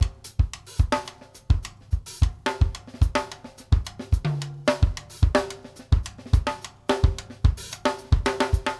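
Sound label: basic, latin, drums; Sampleheads; New York City Drumworks VOL-1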